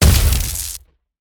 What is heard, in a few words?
CPR Impact, weapon